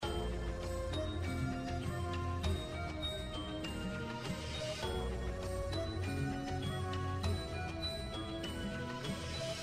BGM Magic Number; Chinese New Year Deluxe

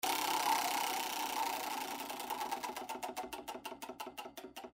ROULLETE; Zodiac Millionaire

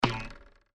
Shoot Arrow; Cupid; Valentine Blast

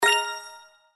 pick Hive; slots; Cash Honey

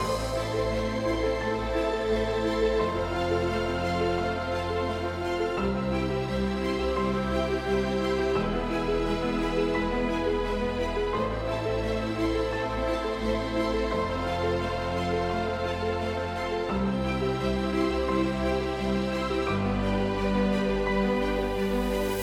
BGM Free Spin; slots; Valentine Blast

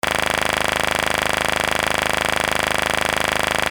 Reel Spin; The Lucky Fairy

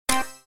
Spin Stop Win; slots; Wildlife Adventure

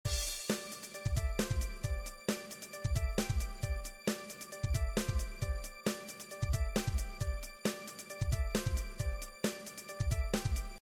BGM Bonus; Panda Majesty2

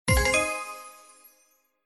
WILD WIN; Lucky Wok

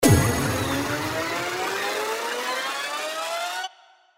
Jackpot Anticipation; Pirate Quest

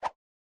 Fly card; TABLEGAMES

